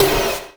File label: Sweep Snare; Sonic Foundry (Sony Creative Software); Esoterik Beatz; Sweep Kit